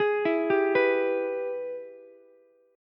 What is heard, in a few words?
Ab Minor Major 7th Sharp 5th chord, Ukulele; arpeggiated; JGuitar